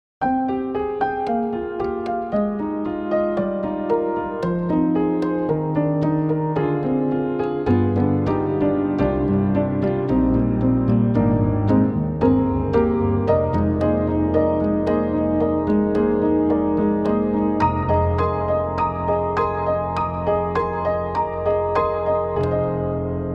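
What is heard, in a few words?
Upright Lonely Basement, piano; Spectrasonics; Keyscape; Wing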